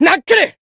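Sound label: attack, Japanese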